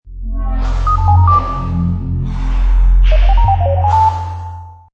Sci-fi, moving and sliding panels or doors; computers beeping; Lynne Music

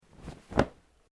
Clothing Kimono Sleeve Flap; Movemement sounds for various articles of clothing; Dressing; Human

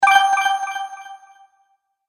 Magic Purchase Delay Ding

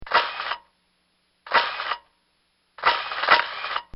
35Mm Camera Auto Shutter; Cameras; Electronics; Bobby Cole

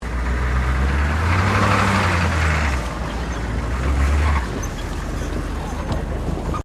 4WD HUMVEE START, US Airforce model Humvee; Trucks; Vehicles

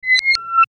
Logo Reverse Synth; Sonic Logo; Blastwave

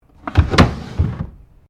Drawer Cabinet Wooden Large Heavy Empty Close; Various domestic drawers; Household

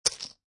Poker Chips Dropping On Poker Chips; Poker, gambling, chip; clicking

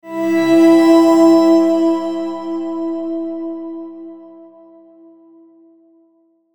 Logo Tonal Shimmer; Sonic Logo Sound Effects; Blastwave

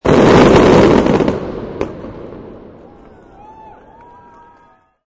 Massive firework bang with cheering.; Fourth of July

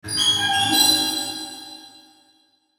Logo Alien Beeps Chimes; Sonic Logo; Blastwave

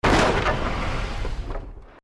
EXPLOSION MEDIUM WOOD; Created explosion effects, house, building or vehicle effects; Bombs; Bomb Sound Effects

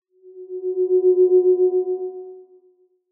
Sci Fi Alien Chime Signal Scanner; Blastwave

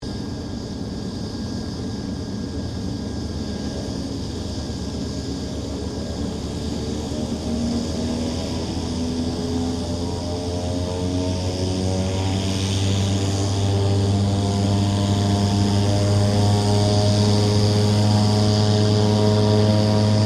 King Air 350 preflight, stereo; Airplanes; Vehicles

